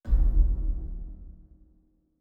Complex Interface, Sci-Fi Sounds

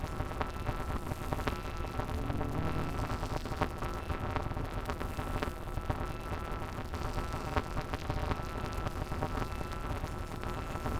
Hum Loop, Sci-Fi Sounds

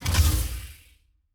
Door, Sci-Fi Sounds

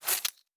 Weapon Foley; Sci-Fi Sounds

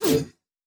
Device Stop, Electric; Sci-Fi Sounds

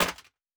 Metal Box Impact; Sci-Fi Sounds

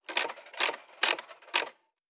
Metal Tools

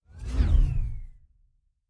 Synth Whoosh, Sci-Fi Sounds Movement

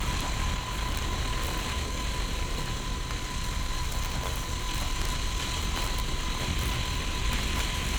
Weapon Loop (Laser); Sci-Fi Sounds

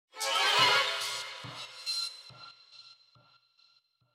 Action Transition, Classic Horror Sci-Fi Music

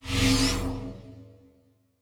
Teleport, Sci-Fi Sounds

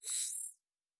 Additional Weapon Sounds; Sci-Fi Sounds

